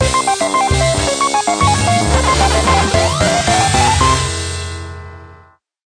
Tema de victoria; Pac-Man; SSB4

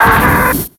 Grito

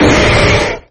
Grito de Machamp

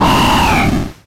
Grito de Whiscash

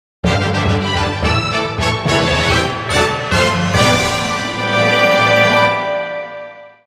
Tema de Victoria; Sonic; SSBU

